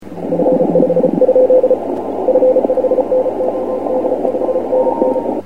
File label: cw